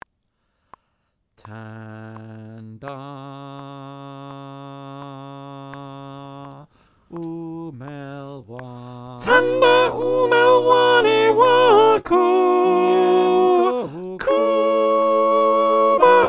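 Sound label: soprano, choir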